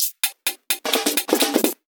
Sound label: Minimal Fills; Minimal Loops